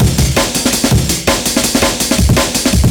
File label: 165 Break, Loops; M8